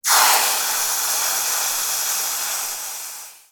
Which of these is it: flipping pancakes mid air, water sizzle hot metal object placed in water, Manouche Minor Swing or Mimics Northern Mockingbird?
water sizzle hot metal object placed in water